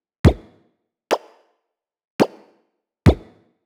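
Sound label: Perc Loop, 123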